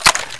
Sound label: ak47 clip release, weapons